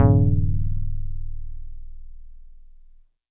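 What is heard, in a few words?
StarPiano DX100 D#0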